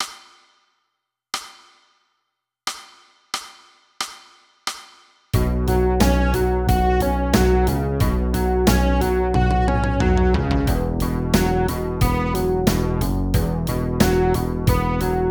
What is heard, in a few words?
song no vocals